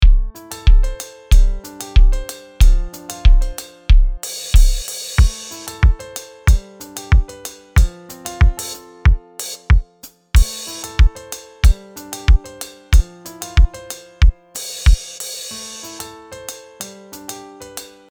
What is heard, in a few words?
Kick